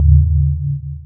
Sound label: magnetize pulse; weapons; dota; earth spirit